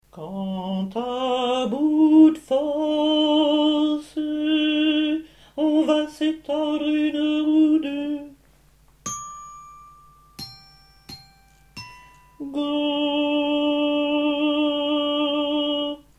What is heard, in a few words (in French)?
tango coda alti